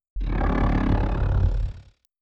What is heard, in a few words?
velociraptor; soundscrate